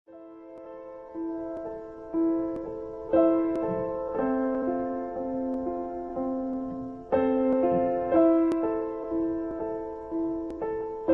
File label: instrumental ringtone